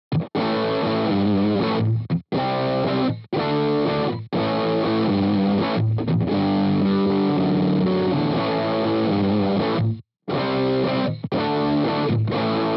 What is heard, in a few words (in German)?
gitarrensound fetter les paul; Layering